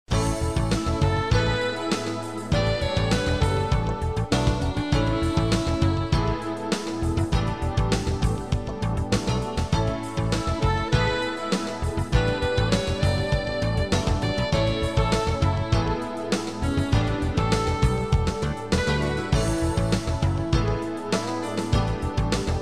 Light Jazz

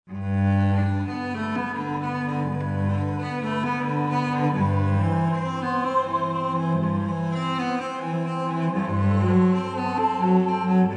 cello suite; bachs

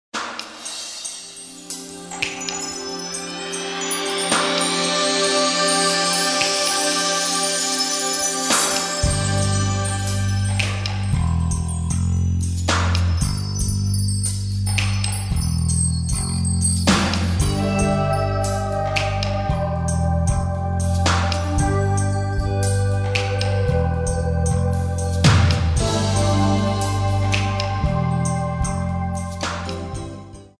light jazz

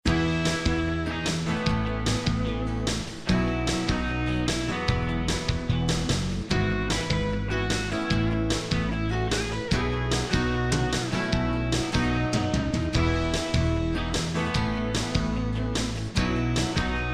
country

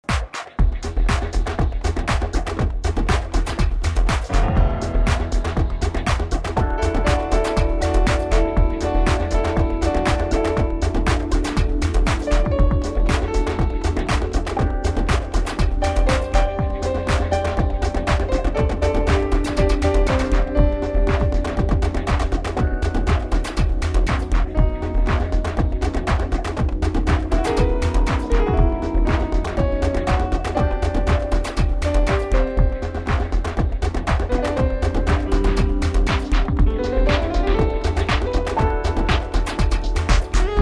contemporary urban pop atomic beat

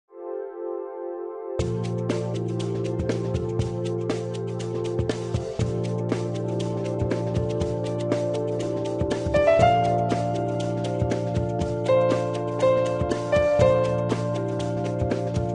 light jazz

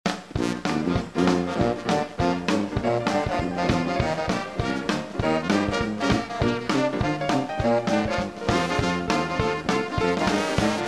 Cajun

